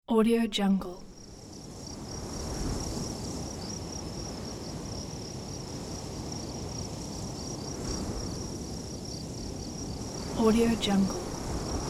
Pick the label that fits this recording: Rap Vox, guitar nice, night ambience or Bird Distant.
night ambience